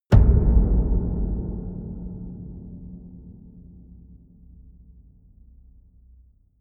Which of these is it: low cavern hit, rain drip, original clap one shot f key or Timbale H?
low cavern hit